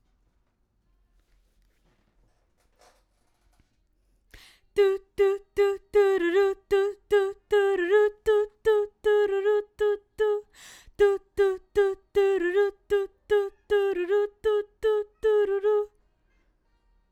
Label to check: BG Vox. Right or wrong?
Right